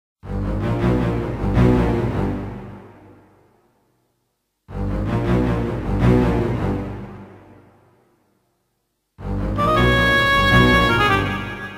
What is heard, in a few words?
FLUTE